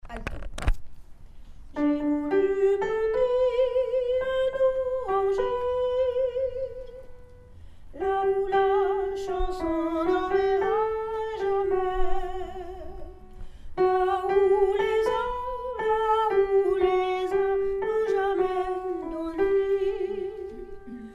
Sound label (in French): alto; Ballade irlandaise alto